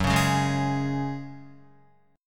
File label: F sharp Suspended 2nd, Guitar; strummed